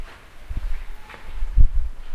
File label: yell